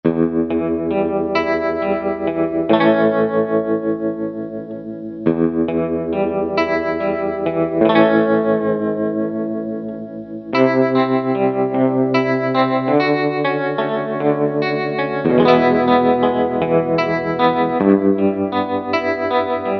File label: GRETCH TREM; Sonic Reality American Heartland